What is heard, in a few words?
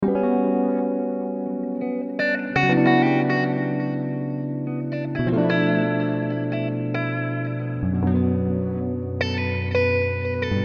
Retro Groove